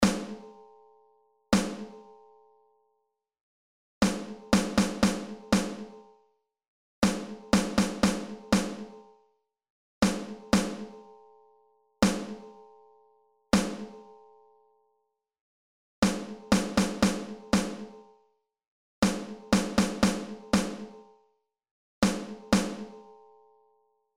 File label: Rhythms Snare Drum